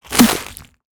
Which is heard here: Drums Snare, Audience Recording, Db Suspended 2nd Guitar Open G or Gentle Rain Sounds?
Drums Snare